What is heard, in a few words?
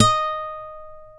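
D#4 FLAMENCO, FLAMENCO guitar; WorldSounds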